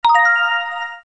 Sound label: War Sound